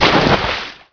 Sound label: JUMP TO WATER